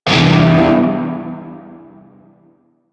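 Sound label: Shawzin Major Chord A; Music; Warframe; Narmer Shawzin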